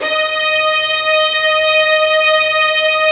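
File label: violin section, Arco Violins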